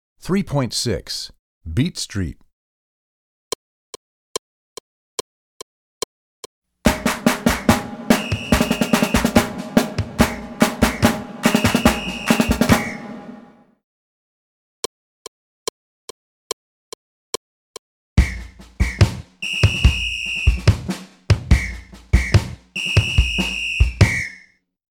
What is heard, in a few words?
Beat Street, Percussion; Percussion Book 2